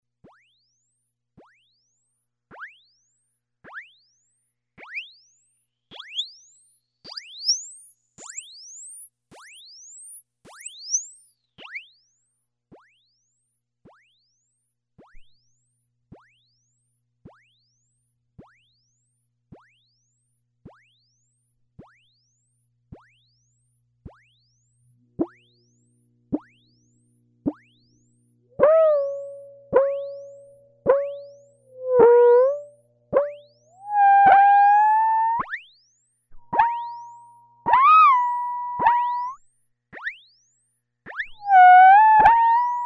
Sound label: square 10kHz Sweep; Plague Bearer